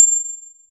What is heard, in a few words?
point